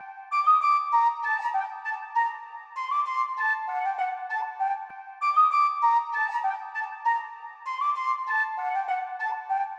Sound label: 98 BPM G Min Flute; Loop Stems; Cymatics; Cymatics Gems Vol 8; Czar